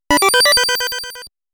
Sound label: COIN; SNES